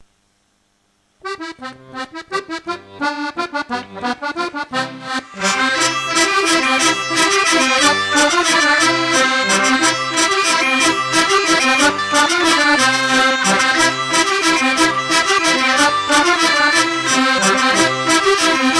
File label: Mazurka